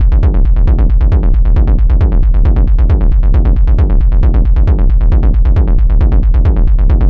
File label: Bass Loop B 135, Techno; Ghosthack; AC2024 - Day 1 - Techno Sounds 2025